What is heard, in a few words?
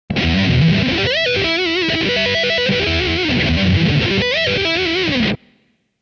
Extended Neoclassical Arpeggio Solo, Metal